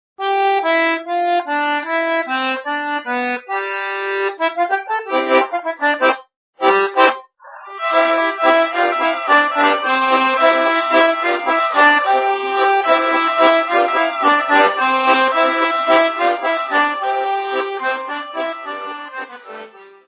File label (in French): MOURAILLADE france auvergne; Moraido